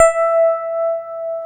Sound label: PIA DX E-PIANOS